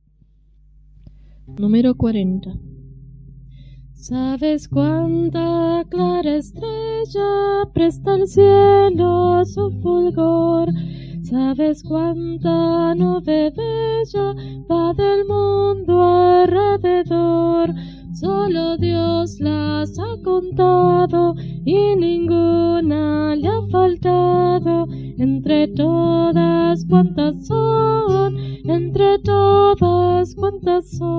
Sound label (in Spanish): Coro, himnario niños